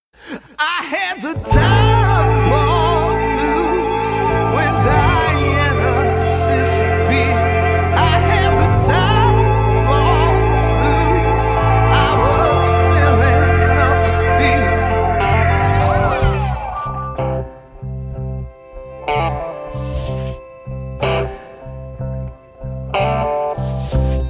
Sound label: Blues